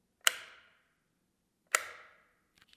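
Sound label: switch; zvuk vykljuchatelja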